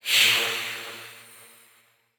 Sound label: Science Fiction Sci-Fi Alien Hiss Through Tube